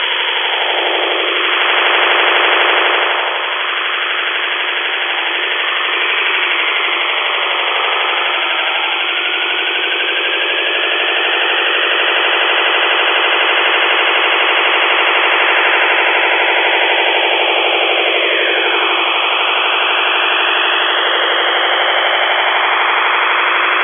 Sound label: telephone